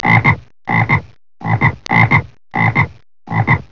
froggy

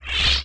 POWERUP

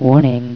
warning; ShutAll

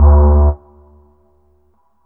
FOG HORN; BEAT2000

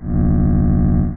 HUM SHOT

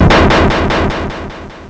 SHOCK

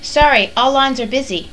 females; PEOPLE

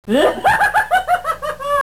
HA HA

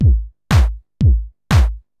BEAT, DANCE DRUMS; MAGIX

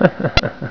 HAHA

